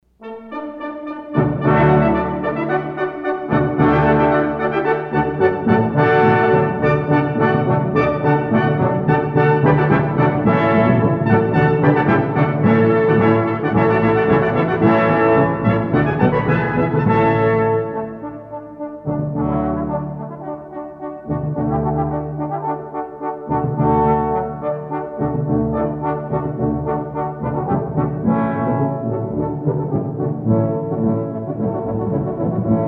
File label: Rejoicing from Royal Fireworks Music Brass Ensemble